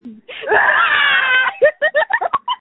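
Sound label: screams that feature laughter; screaming into a phone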